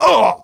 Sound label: Male Hurt; Mars Sortie